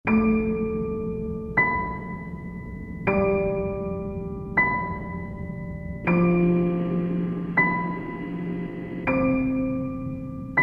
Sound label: piano quality change